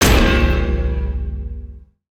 guardian hammer down; boss

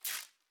swapper hit wall; The Swapper

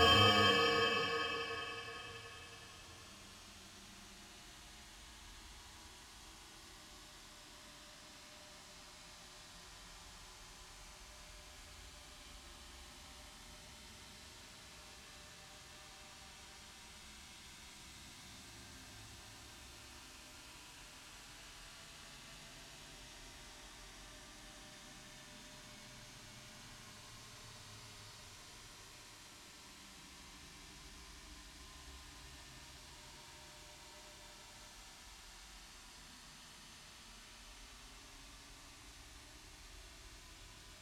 music ambience; The Swapper; metaphysics

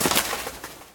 wingflap; World Of Goo; Fish

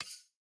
laser step

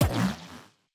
ferro normal hit

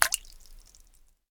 drip, water; World Of Goo

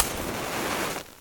ignite; ZBomb

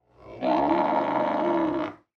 sloth moan; battle

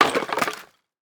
bone destructible

